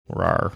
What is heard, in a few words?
"rawr"